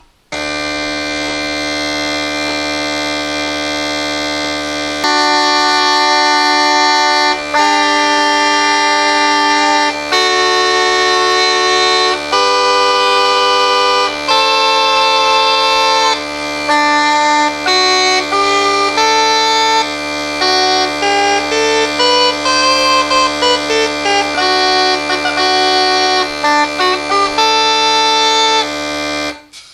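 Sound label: Drones and Regulators, uilleann; Gallagher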